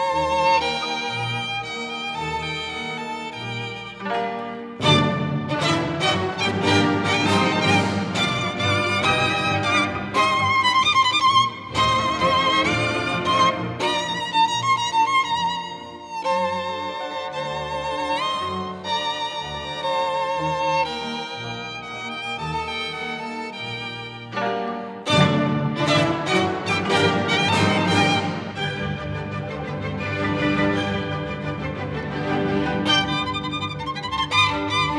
Violin Orchestra

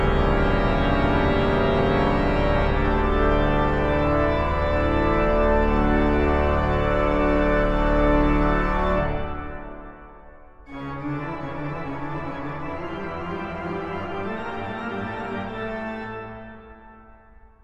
Toccata and Fugue in D Minor

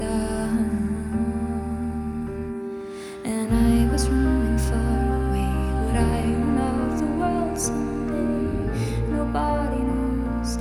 Piano Acoustic

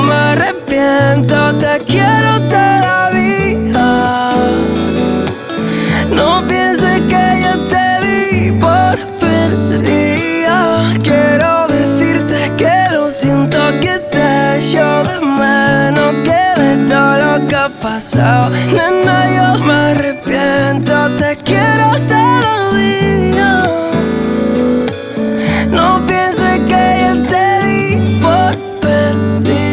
Acoustic Session

